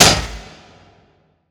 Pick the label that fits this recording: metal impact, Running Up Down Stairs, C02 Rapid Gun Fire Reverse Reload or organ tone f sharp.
metal impact